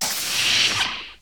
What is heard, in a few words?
Door Closing; Star Trek